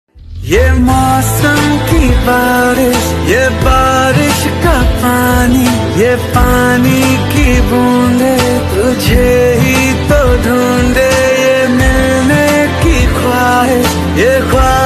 bollywood ringtone

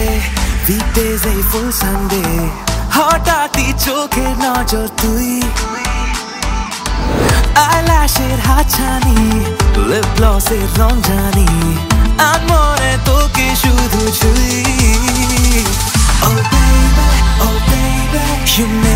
bengali ringtone

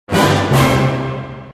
concerto ringtone

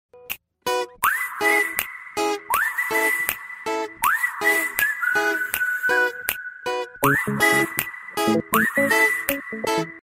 huawei porshe mate 10 ringtone

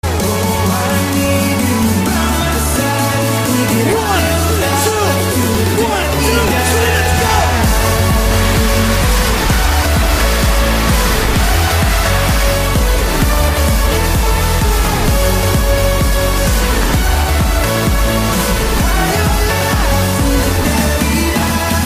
trance ringtone

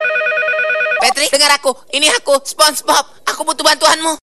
indonesian ringtone; spongebob

